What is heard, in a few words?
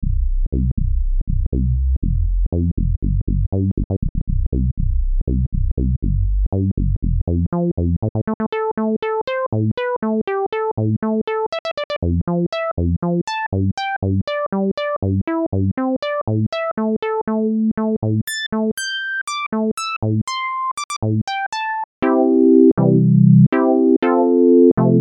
terratec komplexer vsti filter demo lpf24